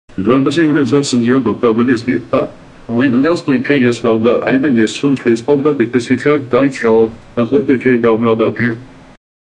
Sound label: quiz correct answer, game show